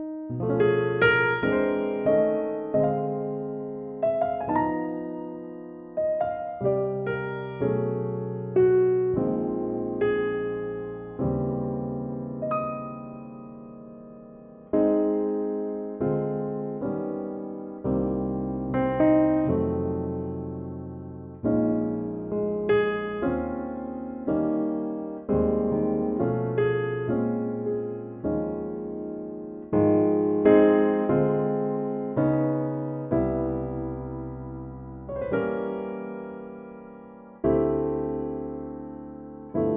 Ab piano

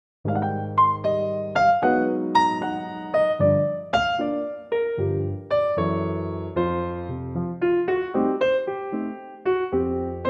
Eb piano

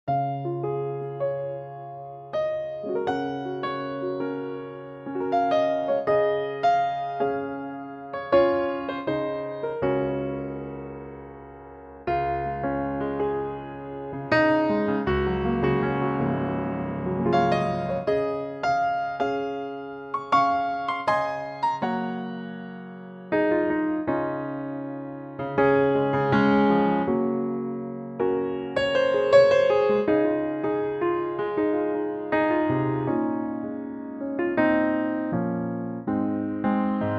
Db piano

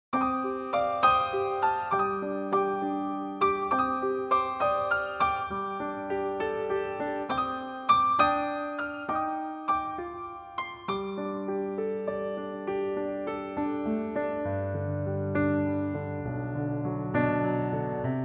piano G

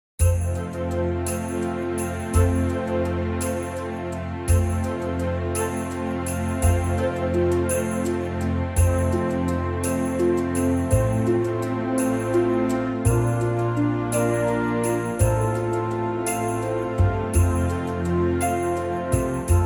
F orchestra

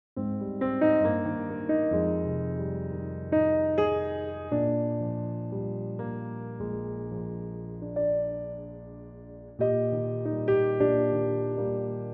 Eb piano trak

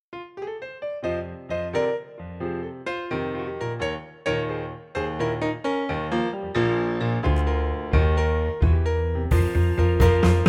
BLUES

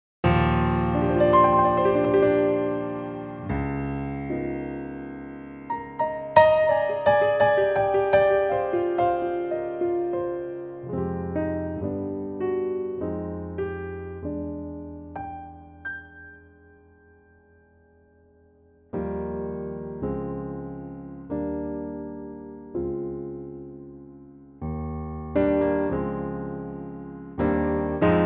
Eb piano

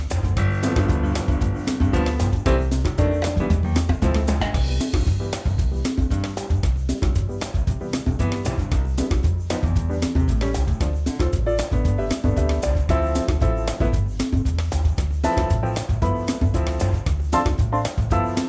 soloing

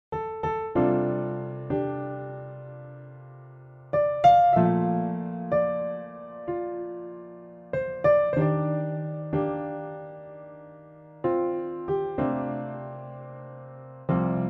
F piano

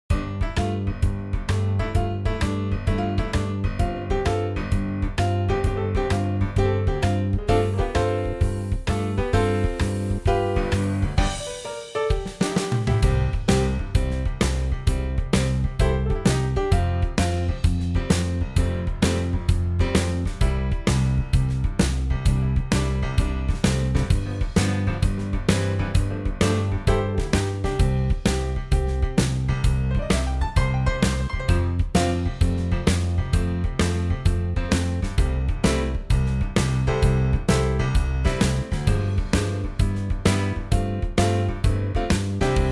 swing C

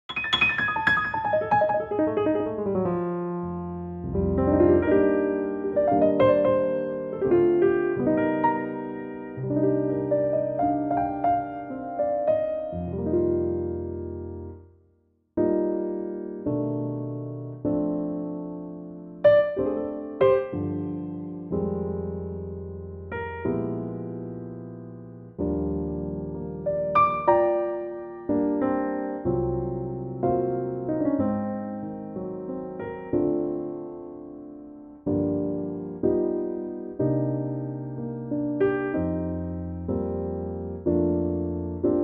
Bb piano

